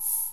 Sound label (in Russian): knopka suhaya zvonkii rezkii blizkii